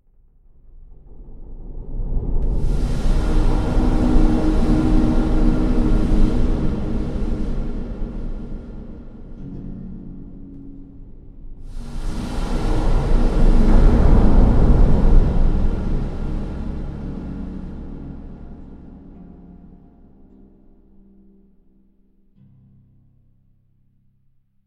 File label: Water Chamber